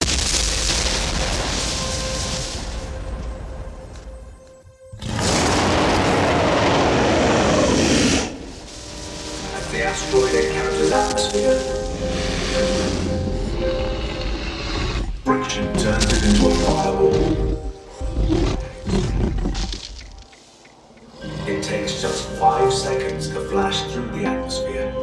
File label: the impact dinosaur dubstep edm electronic music intro